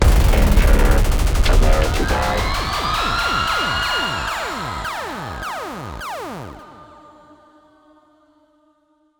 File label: Transition; Stacey